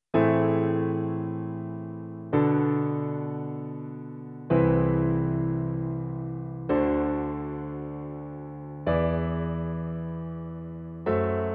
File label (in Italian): fisarmonica accordion, pillole di jazz accordi a quattro voci